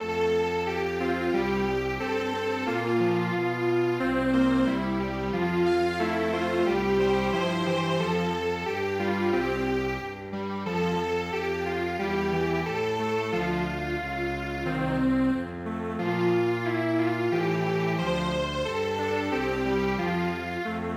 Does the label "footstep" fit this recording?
No